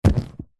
household chair sofa couch leather body limb down press against creak; zapsplat